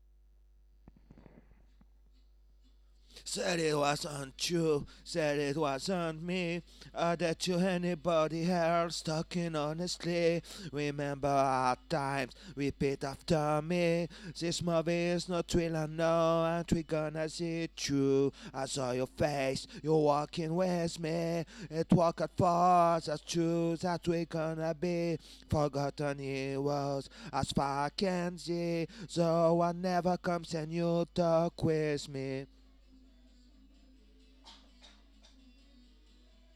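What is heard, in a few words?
Vocals Part 1 no Chorus 1 backing vox on chorus 2 not overdriven; REMIX KIT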